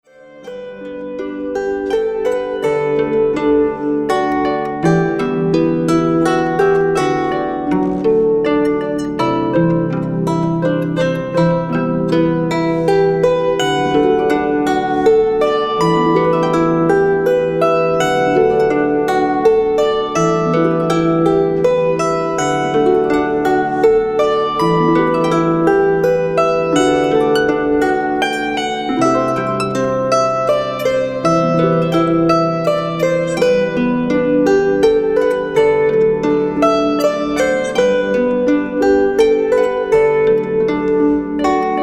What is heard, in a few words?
Improvisation